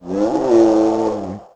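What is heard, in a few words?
scream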